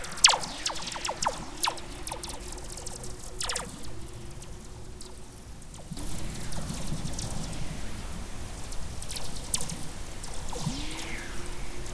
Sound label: WFR audio emfisis